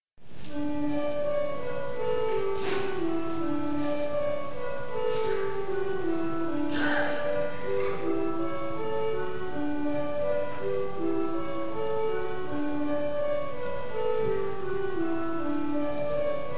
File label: Westminster Chimes